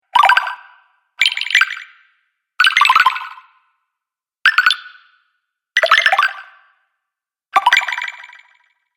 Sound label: Fantasy Game Collect Sound Pack, video game